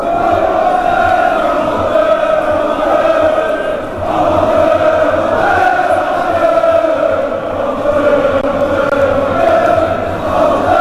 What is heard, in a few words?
chant, fanchants; club brugge